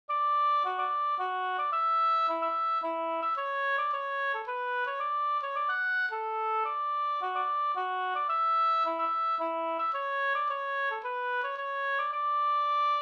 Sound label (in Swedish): hamburska